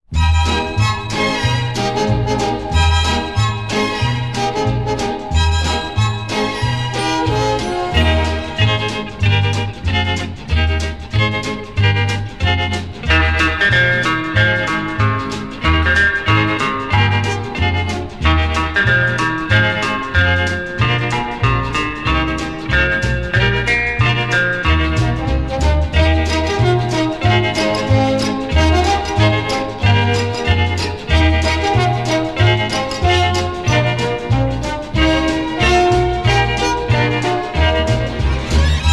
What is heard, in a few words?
Guitars with Orchestra